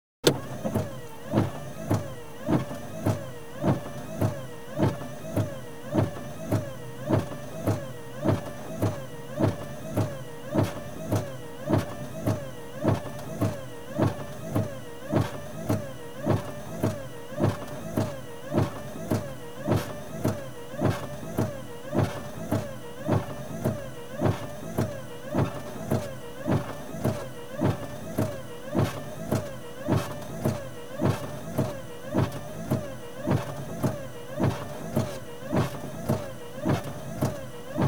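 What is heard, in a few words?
Car, Interior, Windscreen Wipers; Auto; BBC Sound Effects Library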